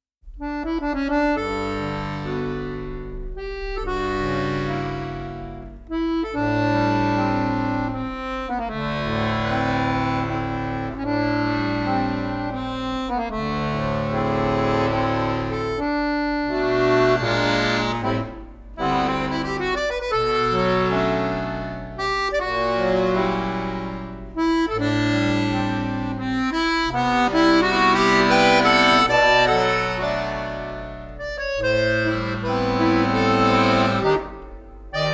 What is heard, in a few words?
Melody